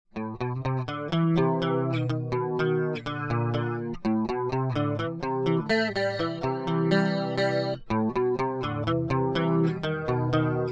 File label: Guitar - Multichorus